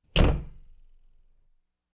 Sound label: Cabinet Door slam